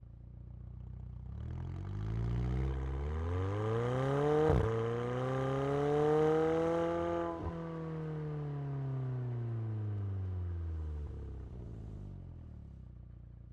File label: McLaren 720S - ONBRD - RAMPS - Drive - EXHAUST - Left; BETA58; Spider SSG 2019; Pole Position